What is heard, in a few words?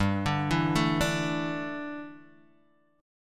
D Suspended 2nd Suspended 4th G